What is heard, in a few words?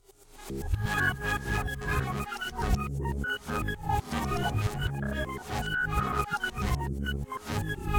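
Impossible-Triangle Music Loop Reverse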